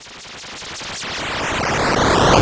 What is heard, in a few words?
Sine FX